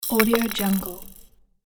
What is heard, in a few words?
rattle toy shaker drop